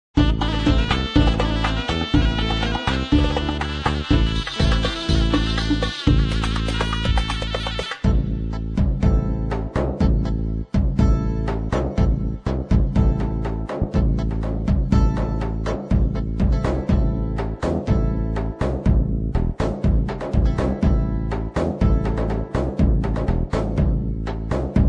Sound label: halay, turkish; pa80; korg